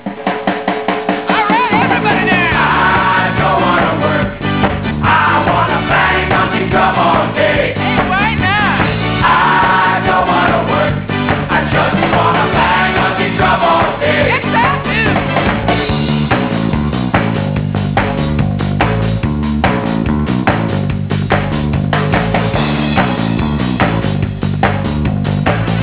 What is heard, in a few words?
bang